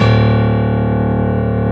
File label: BECHSTEIN C1; East West - Global Sounds